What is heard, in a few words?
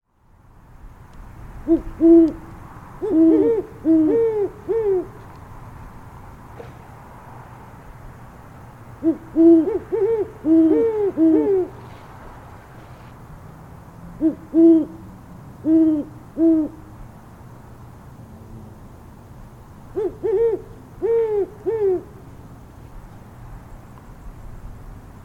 Great Horned Owl Sound